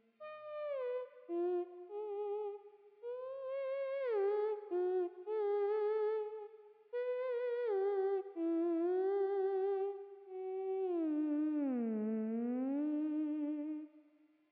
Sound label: Theremin; gamerip; Hylics